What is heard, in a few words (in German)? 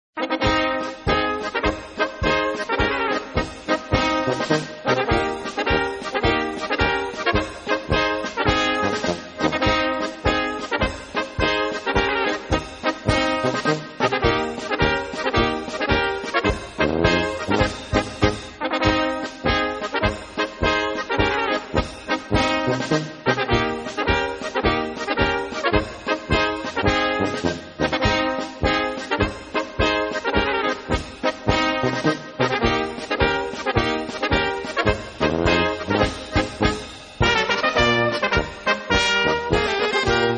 Polka; Wieser Graben Polka; Zweiter Fruehling